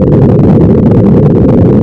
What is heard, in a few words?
boost, vehicle; stunt rally